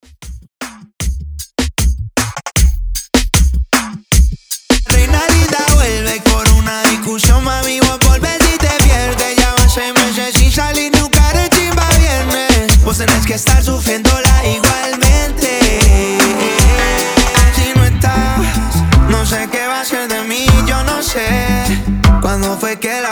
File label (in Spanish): Vox Intro Verso 77 Bpm